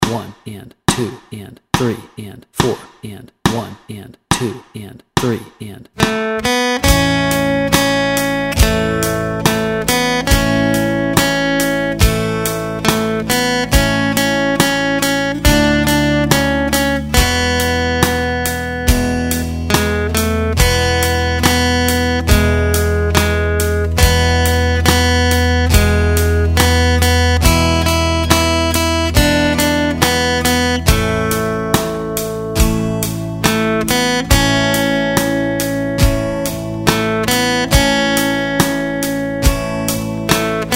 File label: Polly Wolly Doodle Melody and Chords Track, guitar